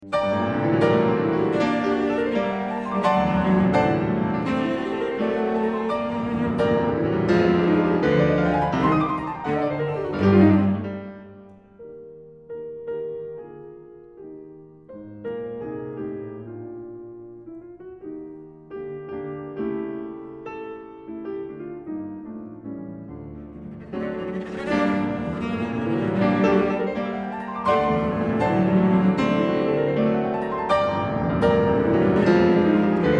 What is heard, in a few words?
Cello and Piano